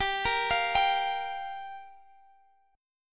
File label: G Minor 7th, Guitar, Open G